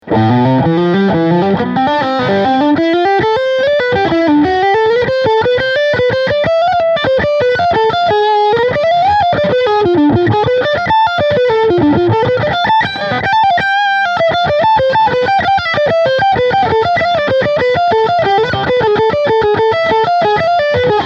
Peavey solo Full Overdrive EQ 12 Uhr

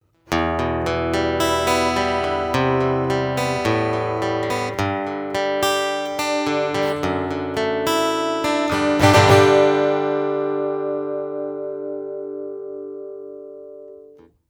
Akustikgitarre Original

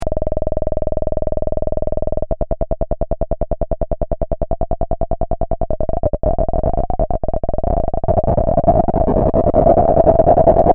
komplexe Modulationen

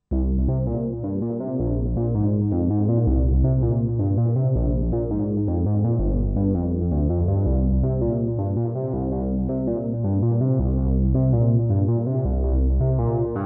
Sequenzer

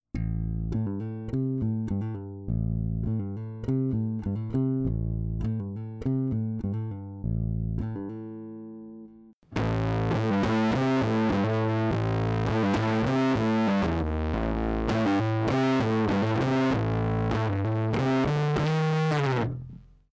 Rickenbacker, Flat Stellung; Fuzzmo

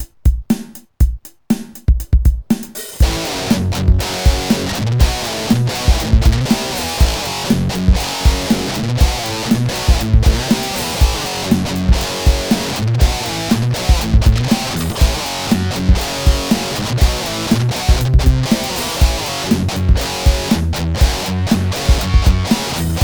sägezahn

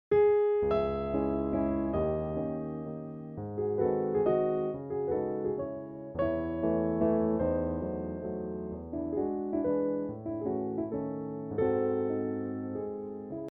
Klassik Piano Dry

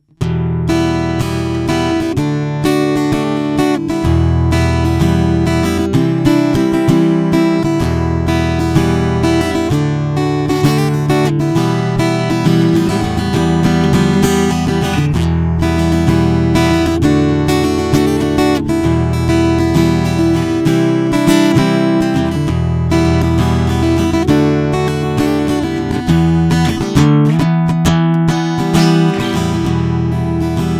12 Saitig Compressor

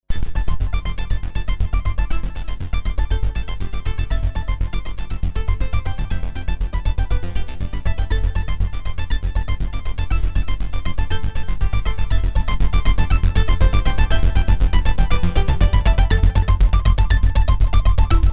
Sequenz

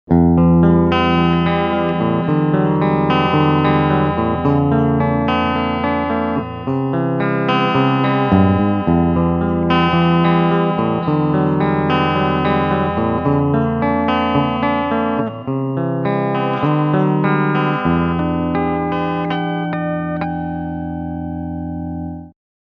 Clean Picking Steg Humbucke

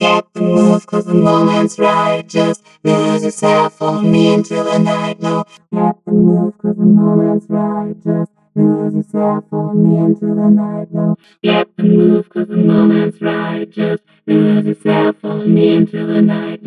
Orange Vocoder Synth Waveforms Complex nur Vocoder; Zynaptiq

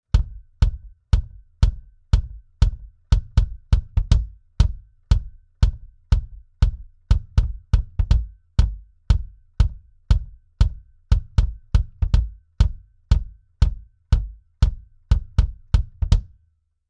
BD Variation ohne Transformator; UnderToneAudio MPEQ-1

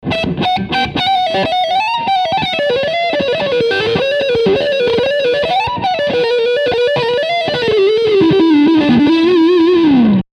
Lick Steg HB High Gain

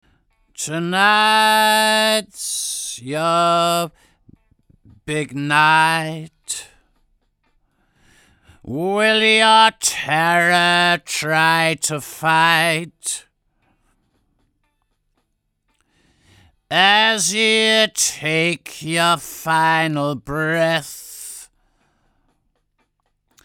Gesang trocken

